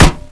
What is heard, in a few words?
kick metal; cstrike; ZombieDarkness